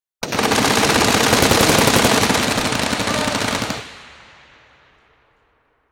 Rapid fire in air defence, machine gun; Machine Guns; BBC

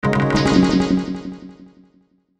Hit Synth Percussion Delay; Blastwave

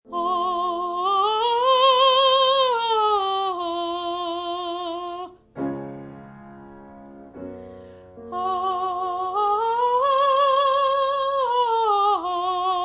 Singing Warmups, Vocal warm-up, singing, female; VoiceBox

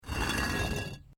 Brick Medium Hollow Move

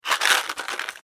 Office Push Pins Box Remove Pin; Miscellaneous Office

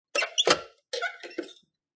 Turn Door Handle And Open Door; Miscellaneous Doors; Lynne Music

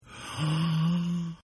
Gasp; Gasping; Sound Effects:Voice; Partners In Rhyme

